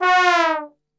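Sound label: F#2 trombone, Brass; Best Service; Brass Super Section; 90 s Sample CDs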